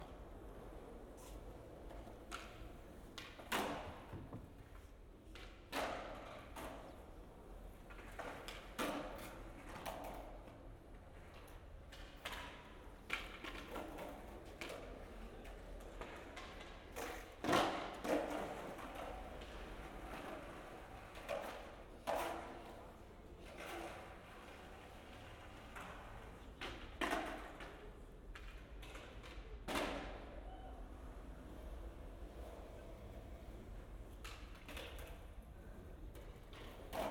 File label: Skateboarder; Lexikon-Roman